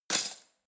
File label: cutlery spoon drawer throw